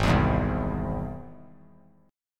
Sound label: G sharp Augmented G sharp; G#+ strummed; JGuitar